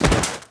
bodyfall stone, foley

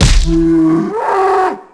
hit cow; animal; Tony Hawk's Project 8; XBOX

